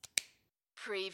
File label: pen place cap on pen